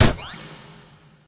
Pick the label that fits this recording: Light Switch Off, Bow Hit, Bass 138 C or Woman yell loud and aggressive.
Bow Hit